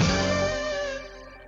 guitar tone; BALLY

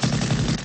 machine gun sound; sfx; WILLIAMS; INDIANA JONES; Indiana-Jones-Original

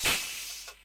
slingshot; BALLY; sfx; TWILIGHT ZONE; Pinsound; Twilight Zone Reorchestrated

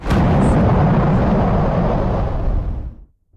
boom; BALLY; TWILIGHT ZONE; Pinsound

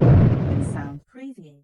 boom; BALLY; TWILIGHT ZONE; Pinsound

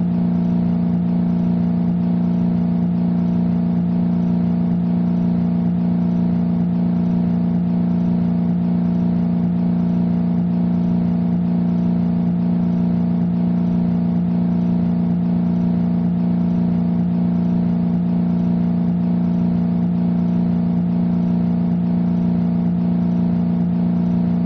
engine ambiance; BALLY; CORVETTE

